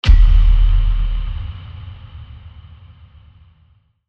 Boom; Aftermath; Titan

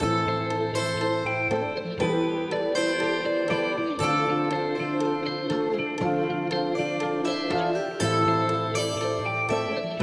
Bandura

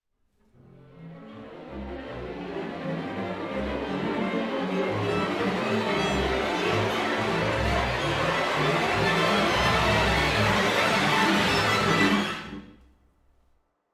Chaos Rising 80bpm

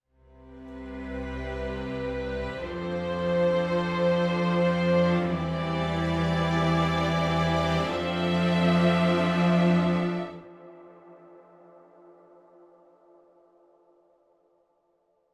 Beautiful Chords Rise C 90bpm

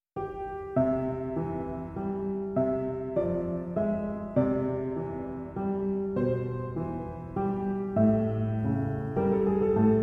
Piano; The Bosque Piano Kontakt Instrument

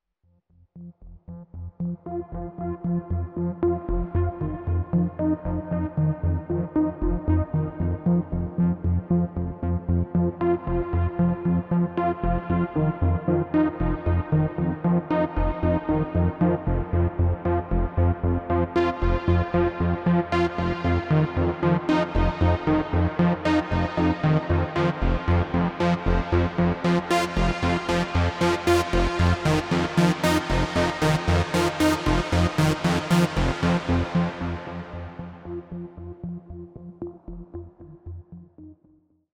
Gleaming Sequence Moog 115bpm Cm

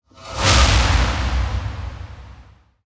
Whoosh Afterburner; Aftermath

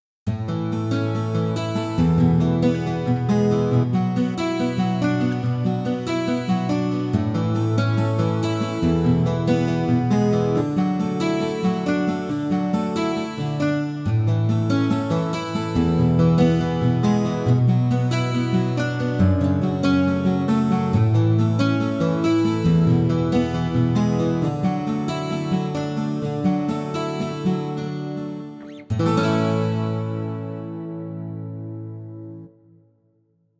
Combination All guitars; Fingerpicked Guitars; Marcos Ciscar

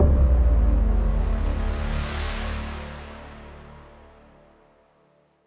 Braam D; CobraBraam Juno

